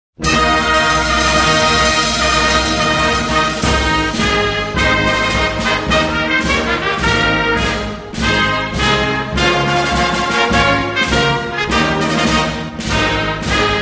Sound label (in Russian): marsh; vstrechnyj marsh 5-go aleksandrijskogo gusarsk